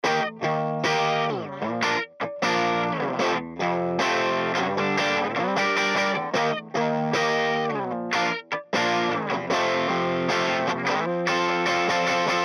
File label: guitar before